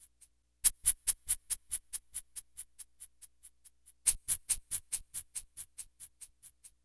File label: Egg Shaker; Sonic Foundry (Sony Creative Software); Continental Drift Aftershocks